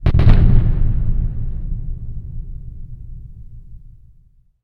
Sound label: explo distant far; Explosives